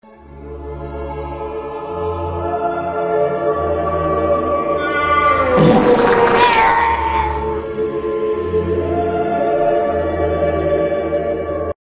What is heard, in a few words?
nooo fart